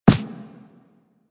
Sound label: Run Default, Footsteps; Warframe; Operator